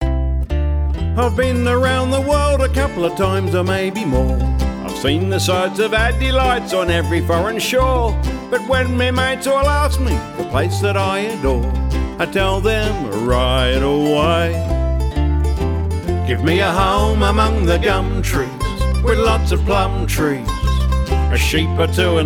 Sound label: Chorus